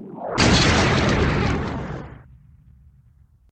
big hit; sfx

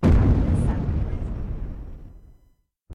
boom; BALLY; TWILIGHT ZONE; Pinsound

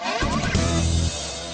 win; BALLY; CORVETTE; Corvette Original

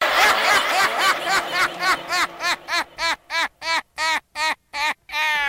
combo; BALLY; CORVETTE

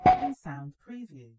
gumball pop; BALLY; sfx; TWILIGHT ZONE; Pinsound; Twilight-Zone-Full-Reorchestration

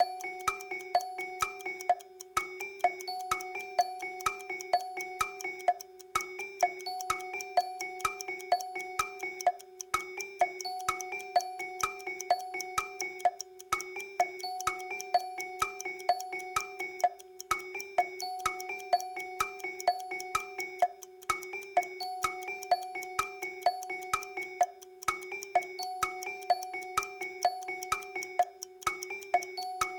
continue, music; BALLY; TWILIGHT ZONE; Pinsound; Twilight Zone Reorchestrated

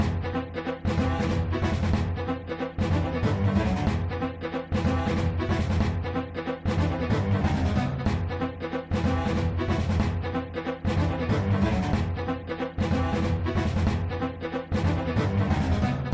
suspens, music; WILLIAMS; INDIANA JONES; Indiana-Jones-Original

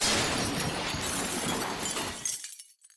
crash; BALLY; TWILIGHT ZONE; Pinsound

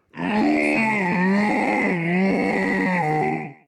aaaaaaaaarrrrr; BALLY; voice; processed; TWILIGHT ZONE; Pinsound; Twilight-Zone-Full-Reorchestration

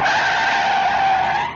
braking car; BALLY; CORVETTE; Corvette-Original

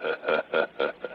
hahahahaha; voice

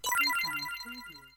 skill yellow; BALLY; TWILIGHT ZONE; Pinsound

